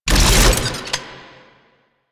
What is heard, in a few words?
Beam End, Weapons; Warframe; Archwing; Larkspur Prime